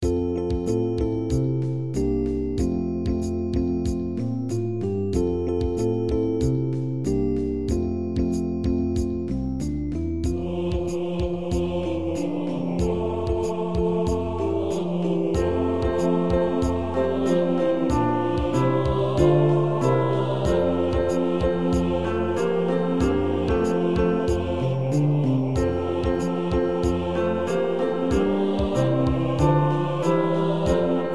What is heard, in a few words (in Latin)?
sanctus alto fast; paulusfest